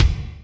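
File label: kick Hanger, Alesis D4; 300 Drum Machines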